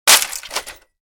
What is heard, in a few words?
printer smash